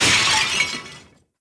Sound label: Clink; blue archive